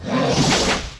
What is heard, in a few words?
airboat boss attack